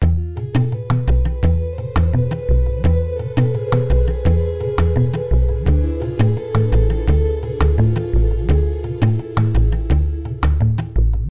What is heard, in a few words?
Desert Arabic; marker man adventures 2009 nds gamerip; Markerian